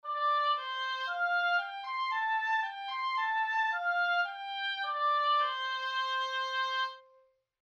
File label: Oboe Dry; HW WW 1; Micposition